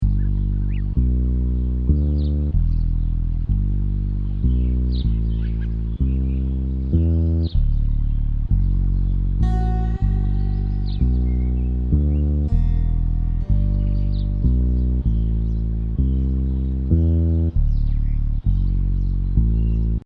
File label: tiki room loop; curiouslystrongallnightlong